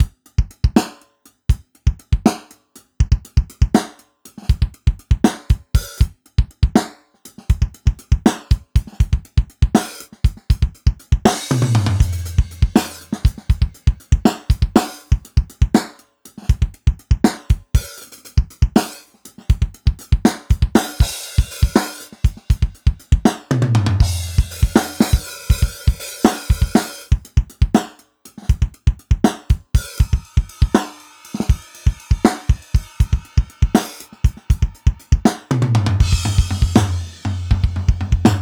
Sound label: Drum Mix; Precedence